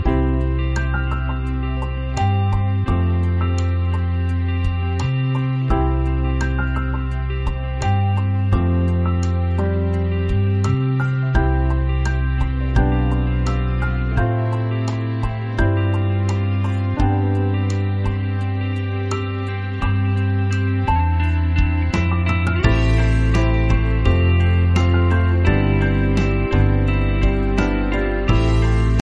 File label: little romantic piano